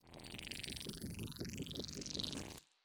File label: Boss-Transition